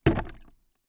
household plastic mop bucket with water inside put down on ground